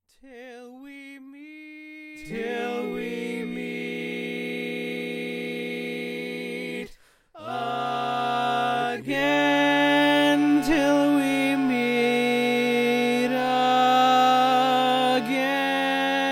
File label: Bari, barbershop